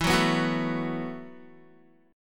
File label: E Suspended 2nd Suspended 4th, Guitar; strummed; chordshape; JGuitar